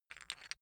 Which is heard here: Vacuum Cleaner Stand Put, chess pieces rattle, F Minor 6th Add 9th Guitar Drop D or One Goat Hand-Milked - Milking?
chess pieces rattle